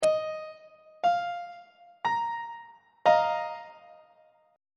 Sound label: b flat suspended 4th 1st inversion on treble clef